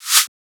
Shaker

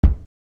Kick

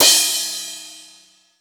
Crash

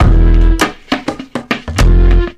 Loop

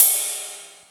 Ride; FLPRO

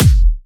Kick, Kicks; FLPRO

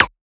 Perc, Percussion; FLPRO

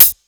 Hi Hat

